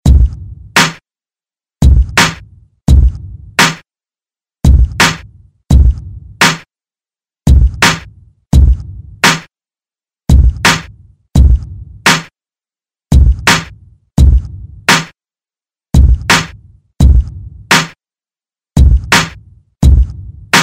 Top40

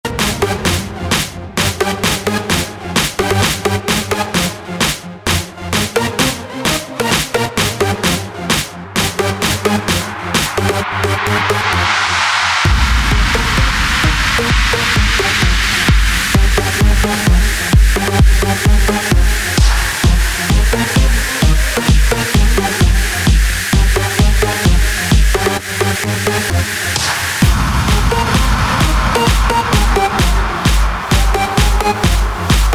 Trap Remix

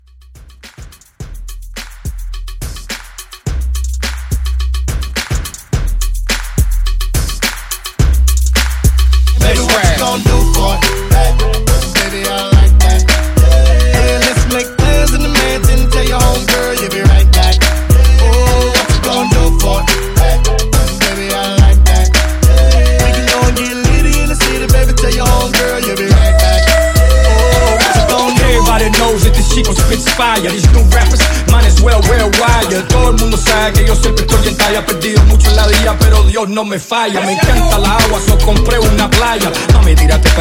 Hybrid Re Drum 106

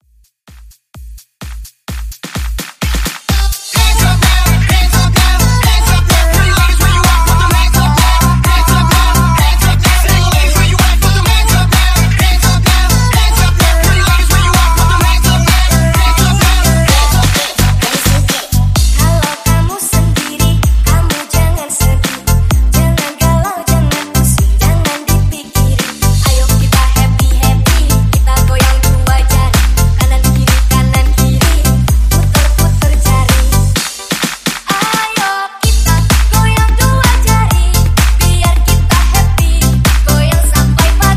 Bounce Mix 128 Bpm

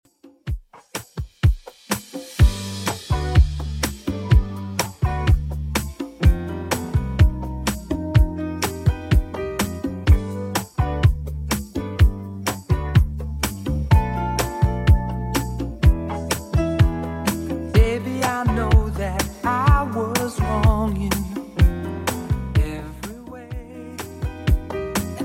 80s Redrum 125bpm

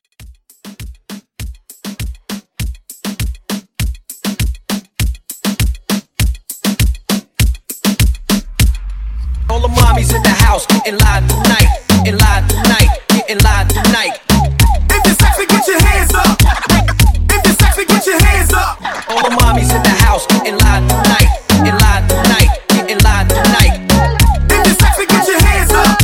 Moomba Hype 100

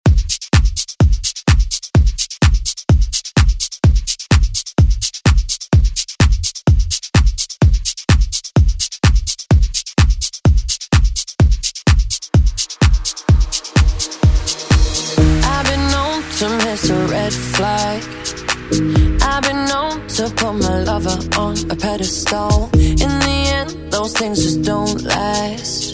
Pop Redrum; Deejay Hit

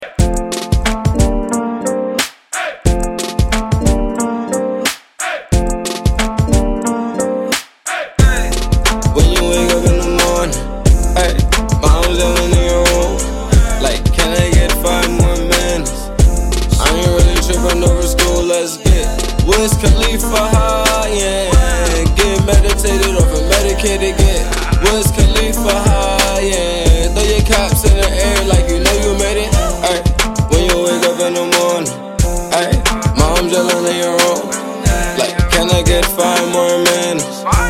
Club Redrum

